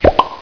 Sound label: Pop; Revelation Sight and Sound SK